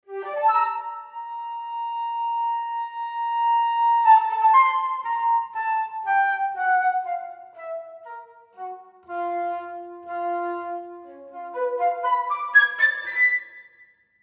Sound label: staccato, staccato short, sustains, portato long, portato short, and playable runs; sampled woodwind instruments; Berlin Woodwinds; Orchestral Tools